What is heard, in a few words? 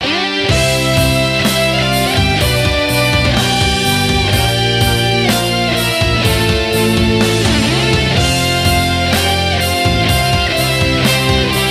Music Game General event